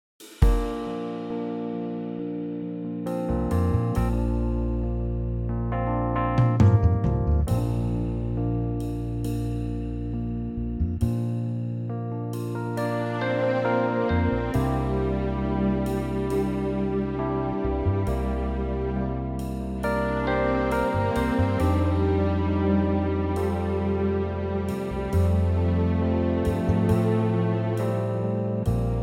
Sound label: Bb orchestral